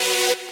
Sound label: saw